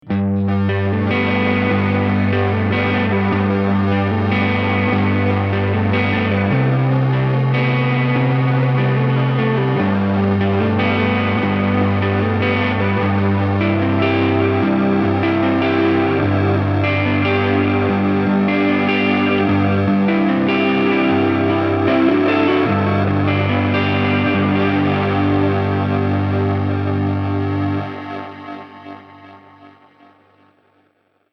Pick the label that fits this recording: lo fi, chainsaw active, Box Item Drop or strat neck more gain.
lo fi